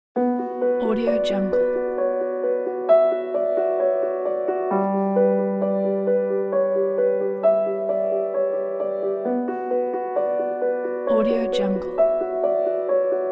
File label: melodic narration